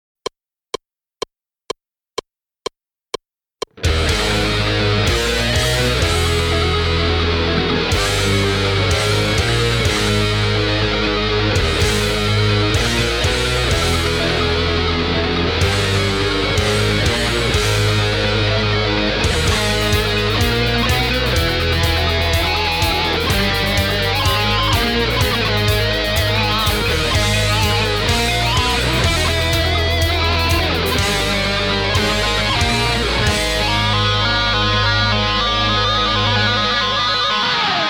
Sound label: WAH